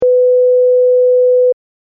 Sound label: Censor Beep; Beep Sound Effects; electronic sound effect